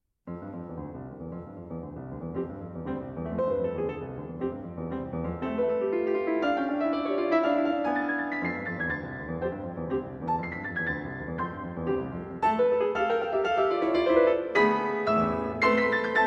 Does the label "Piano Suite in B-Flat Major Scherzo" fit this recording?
Yes